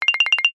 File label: cartoon flutter blink high pitched